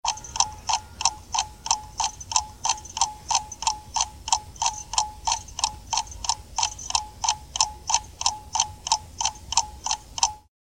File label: Clock Ticking